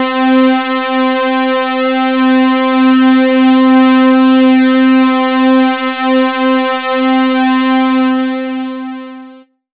Synth Atmos; Best Service ProSamples vol.36 - Chillout

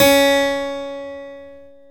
KEY HRPCR; Roland - LA Composer vol. 1

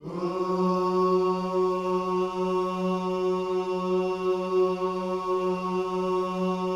OOS F#, Vocal Gospel

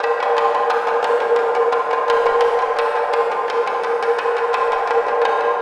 Acoustic HD, Percussion

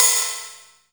DMX ride; Greytsounds; DMX KITS

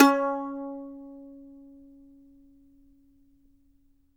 mando notes C#4; Sampleheads; Whole Lotta Country 1 & 2

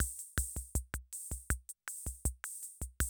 CR78, DrumLoops

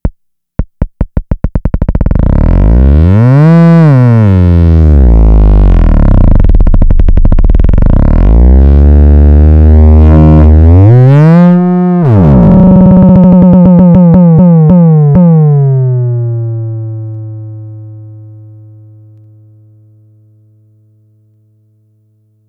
FX, Drum Machines; Fricke Schlagzwerg

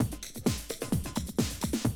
130, Classic DrumLoops

